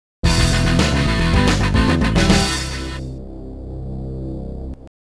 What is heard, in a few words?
Winner; Winner (Victory Demo); king of fighters n.e.s.t.s. dream sound legacy